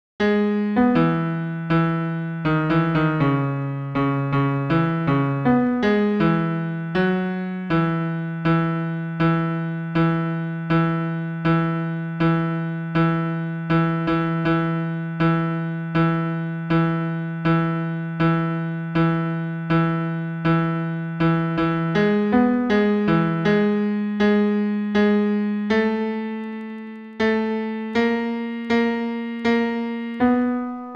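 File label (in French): basse piano seul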